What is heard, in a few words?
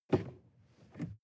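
sports car interior pedal brake medium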